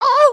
need to pee again; emotes

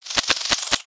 Spiderling Stunned Struggle; creatures mechanical

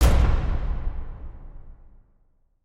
piston arrive; spaceship

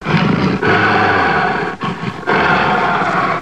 Gorilla Breathing Mad; creatures organic

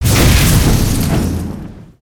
Spinjitsu Fire Tornado Start

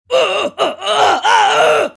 minifig shocking death

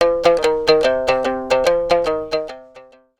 Egyptian Dance

